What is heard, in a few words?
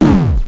Bad Hit Sound; Beat Saber Miss Sounds; UndertaleHurt